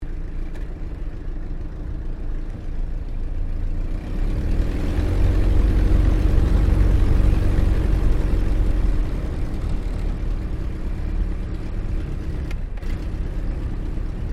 AIRCRAFT DH82A DEHAVILAND TIGERMOTH TAXI SHUTDOWN INTERIOR; RAAF Tigermoth; Airplanes; Vehicles